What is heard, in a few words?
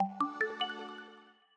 popup up; ui; muqin; lineageos